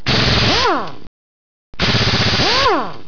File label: Air Wrench